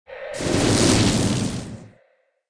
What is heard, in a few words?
Dark